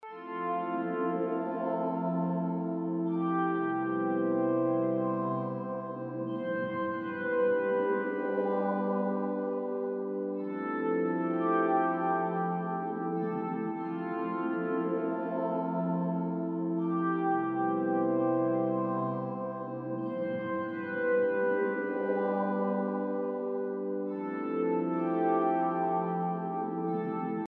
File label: pad loop